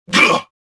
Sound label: gasp; Star Wars Jedi Knight II Jedi Outcast; XBOX; weequay